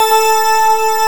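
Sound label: ambient pad, synthesizer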